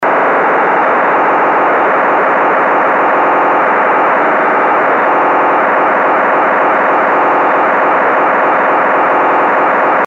Moto3600 Type-II OL

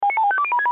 SelCall Police

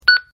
Kenwood PTT Proceed

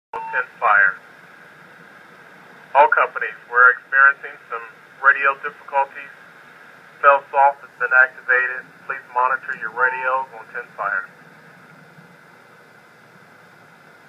Moto T2 Failsoft

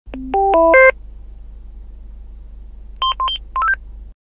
XPR Powerup

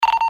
Moto Quad TPT

